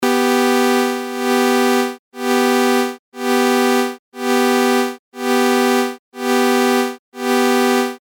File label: lfo